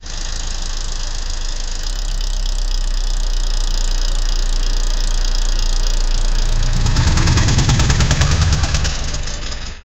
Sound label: bike running sound